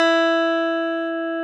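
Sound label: DAMP GTR E4, mute guitar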